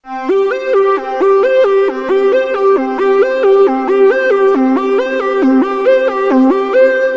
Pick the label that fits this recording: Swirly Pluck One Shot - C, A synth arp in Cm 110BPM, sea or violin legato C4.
A synth arp in Cm 110BPM